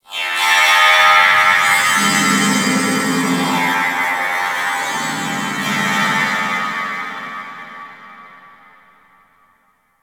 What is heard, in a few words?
Space Trench, Space Battles Ambience; Warframe